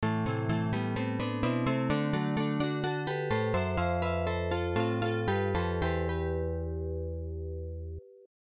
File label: Harp; Dinosaur; FM Towns